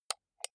computer mouse right click slow

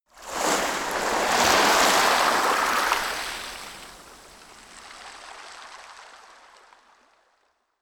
coast oneshot surf soft

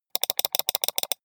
computer mouse click sequence steady

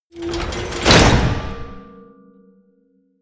mechanical lever metal large up

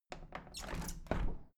window plastic squeak open heavy sequence

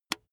razor attachment retract

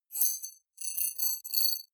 rattling notification

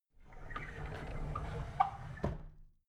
drawer big squeak close soft

